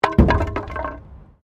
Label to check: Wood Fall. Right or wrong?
Right